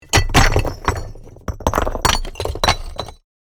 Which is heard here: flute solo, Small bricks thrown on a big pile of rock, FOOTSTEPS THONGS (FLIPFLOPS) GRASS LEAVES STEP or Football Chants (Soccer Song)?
Small bricks thrown on a big pile of rock